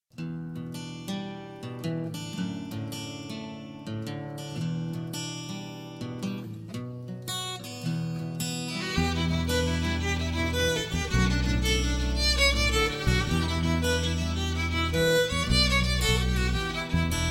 jig; Swallowtail Jig

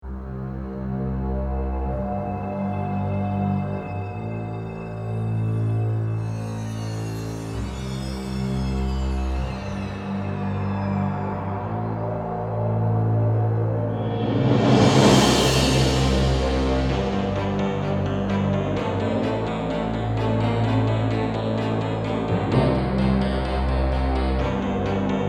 pulse

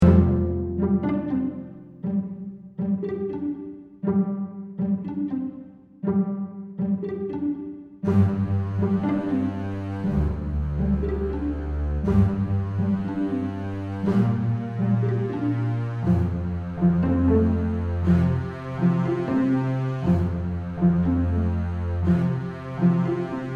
winter arpeggios

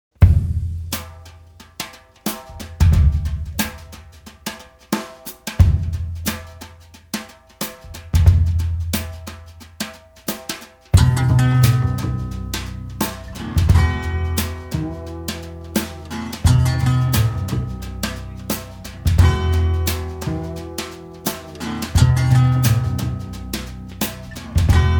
blues music

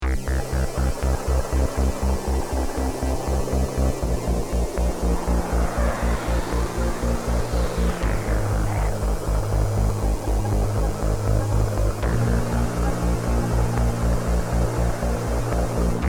instrumental; The Spider's Parlor

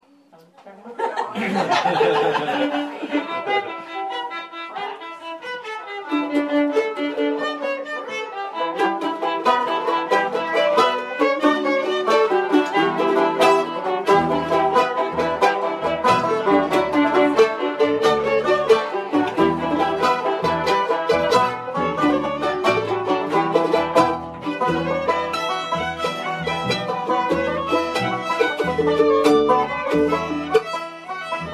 Swallowtail Jig; Western; Wiley Thanksgiving

